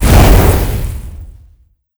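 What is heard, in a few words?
boss explosion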